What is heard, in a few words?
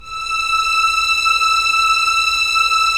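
Strings Violins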